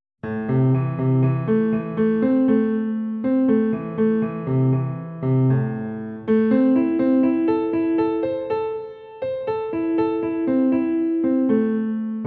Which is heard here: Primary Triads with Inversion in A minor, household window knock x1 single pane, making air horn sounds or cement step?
Primary Triads with Inversion in A minor